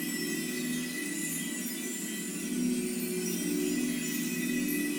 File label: light surge